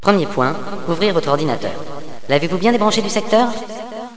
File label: kit; Villagina; ecole